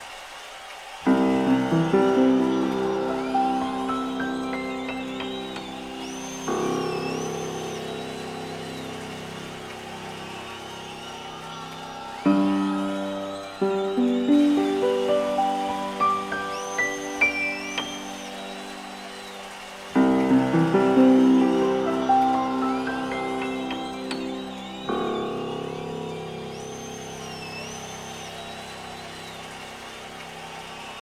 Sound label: Piano Open Lid; TBone Ovid CC100; Wembley